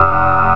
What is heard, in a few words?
BUZZ